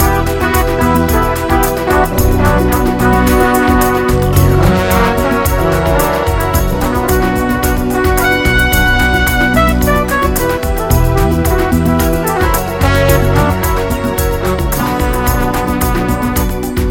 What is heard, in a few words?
Lovely Southern Latin